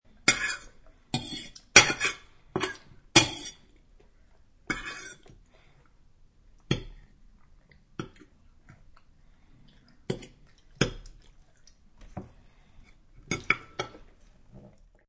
Slvr Wr Scrp w Chwng, Scraping plate with knife and chewing.; Silverware Scraping and Chewing; Eating; Human